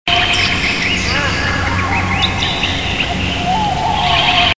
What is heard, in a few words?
forest sounds